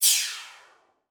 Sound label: Flash Animation Short; Multimedia Internet CD-Rom Flash